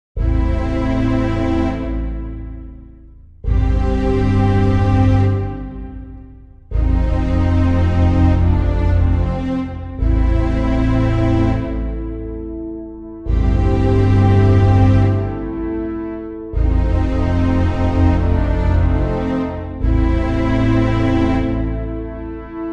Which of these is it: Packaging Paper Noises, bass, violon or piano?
violon